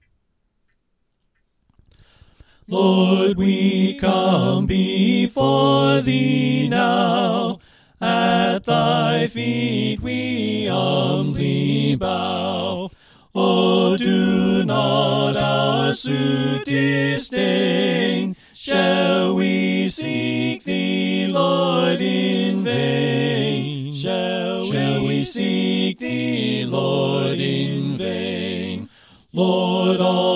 Soprano Right

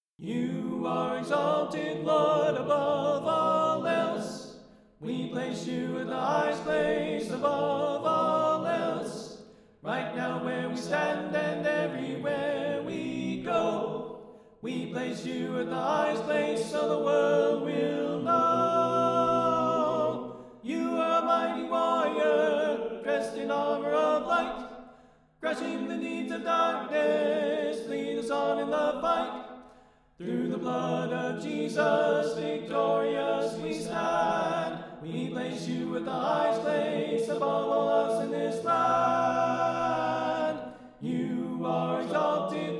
Alto Left

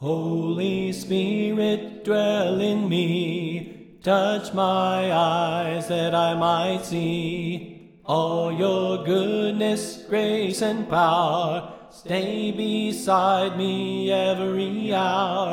Soprano Left

